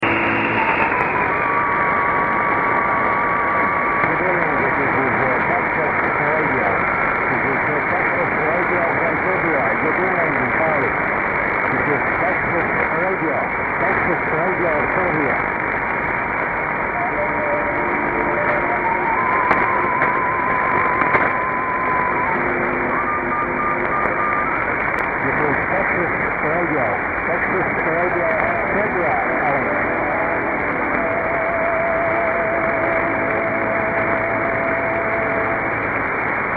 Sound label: Serbia 1701 kHz; Texas